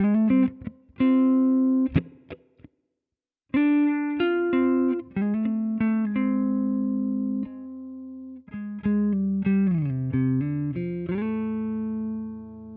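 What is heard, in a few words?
Hagstrom Ultra Max Special Neck Clean, guitar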